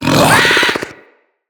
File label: Infested Ancient Grapple Vocal; Enemies